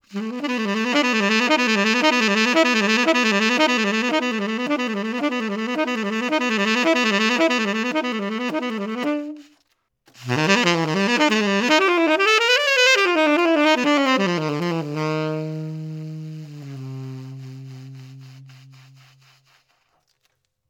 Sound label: Bebop; SYOS; Wilbur